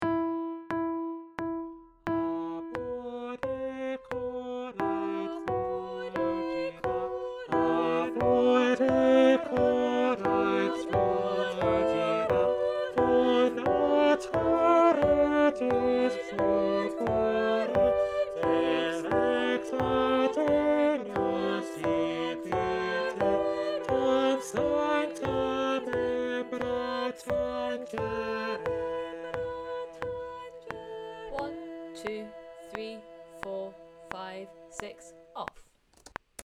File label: round bass